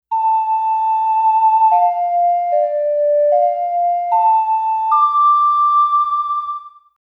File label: Ocarina; The Legend of Zelda: Majora's Mask 3D